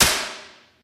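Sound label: physics clipboard impact large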